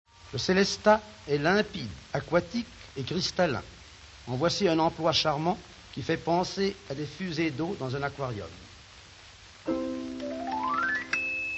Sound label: orch celesta